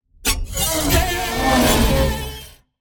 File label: Metal wires dissonant phrase